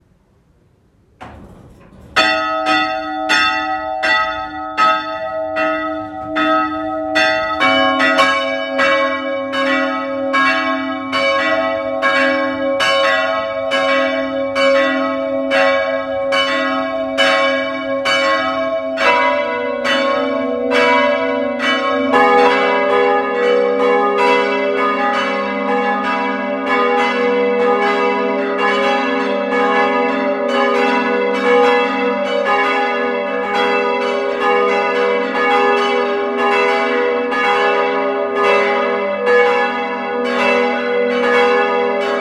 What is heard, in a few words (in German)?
Glockenlaeuten, Gustav-Adolf-Kirche; Heusenstamm; Glockenläuten; Das Glockengeläut können Sie von Montag bis Freitag jeweils um 8.00 Uhr, 12.00 Uhr und 18.00 Uhr, am Samstag um 12.00 Uhr und 18.00 Uhr